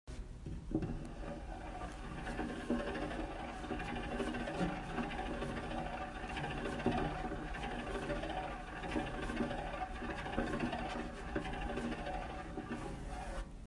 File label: fan